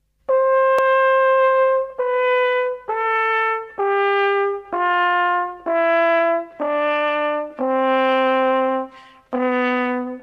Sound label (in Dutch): Koperblazers hoorn van hoog naar laag